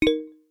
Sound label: glass break